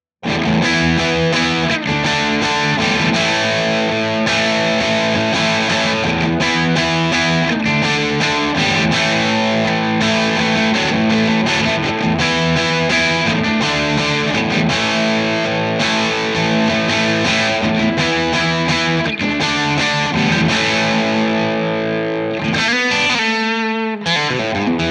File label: crunch; jensentone